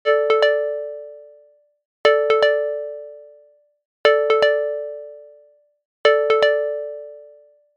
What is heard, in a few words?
bell ringtone